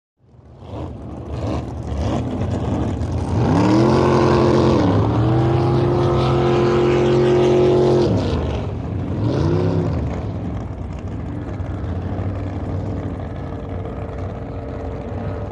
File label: Drag Boat Racing Amb